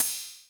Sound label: ride, Millenium MPS-400